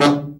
BRA Bari, SAX; Best Service Gigapack 1; BR SAX 2

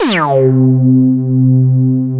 OB A3 -L, Rare Synthesizer; Hollywood Edge - Giorgio Moroder Rare Synthesizer Collection; OB 4 VOX.

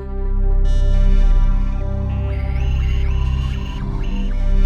WS DA C 1, Korg Wavestation; Back In Time Records; Korg Universe VOL-1

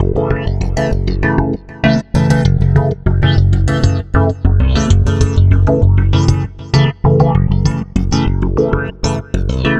Stick Loop, Stick Bass; Sonic Foundry (Sony Creative Software); Leo Cavallo Bass Taster

